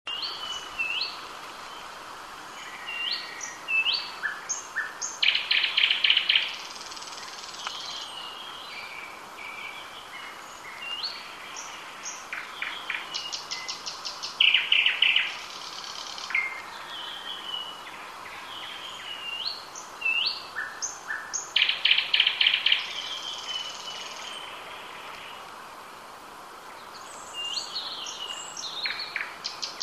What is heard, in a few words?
Luscinia luscinia